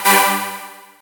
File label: stop rec, camera; Sony Ericsson Xperia X10